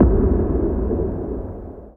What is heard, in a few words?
NOIS; XXL 800 Production Effects